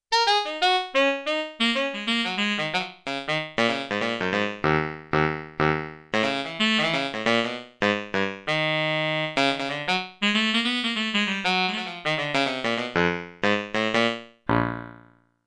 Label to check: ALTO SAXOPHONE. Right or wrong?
Right